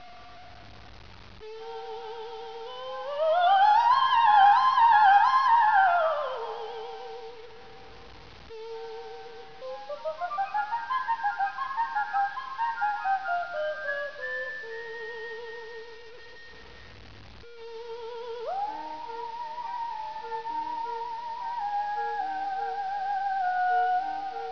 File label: cadenza